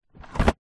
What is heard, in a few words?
vehicles scooter moped seat drop down